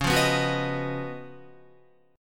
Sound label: Db 11th Db, Guitar; strummed; JGuitar